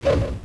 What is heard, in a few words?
p900 roll, weapons